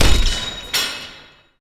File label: oil launch; Gamestar